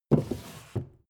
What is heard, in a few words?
book shelve